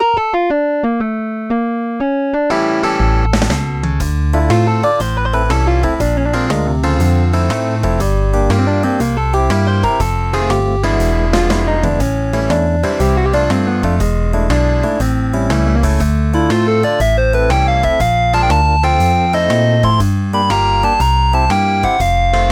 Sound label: jazz; First Person Slapper; StudioFreresChasseurs